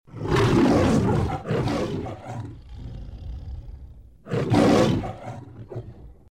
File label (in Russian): rev lva; iz metro goldwyn mayer metro golden majer